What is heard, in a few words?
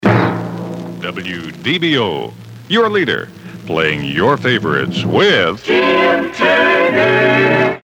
jingle